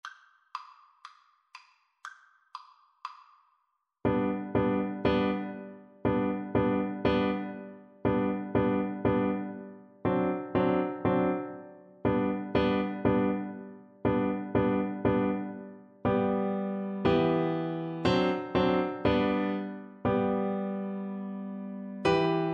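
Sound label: viola karaoke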